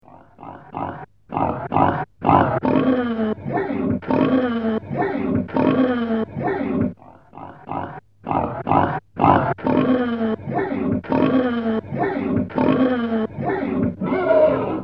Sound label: Jingle